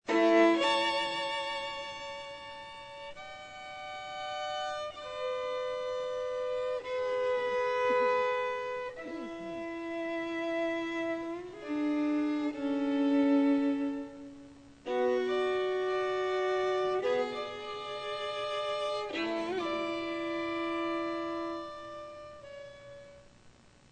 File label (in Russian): skripichnoe wstuplenie